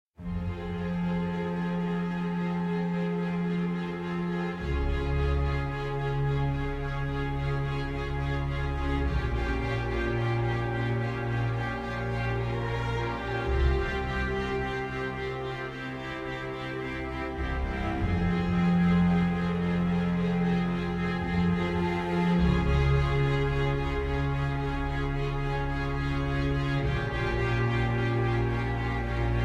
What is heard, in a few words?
Andante Espressivo - String Orchestra